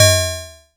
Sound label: BRIGHT, PERC HITS; Zero-G Ambient 1